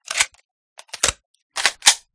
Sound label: scorpion reload, guns; resurviv